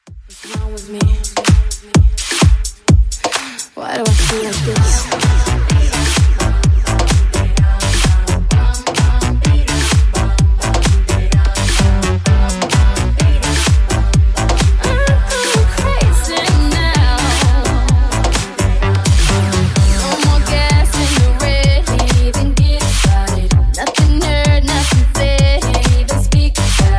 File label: House 128bpm; Bootleg